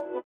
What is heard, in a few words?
sitar, Indiatek; Spectrasonics; Metamorphosis